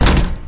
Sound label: door